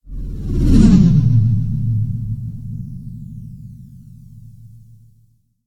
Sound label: Large Object Fat Whoosh